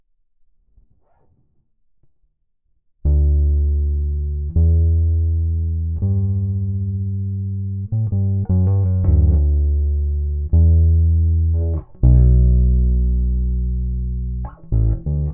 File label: bass chorus